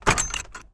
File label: Gate close; Phasmophobia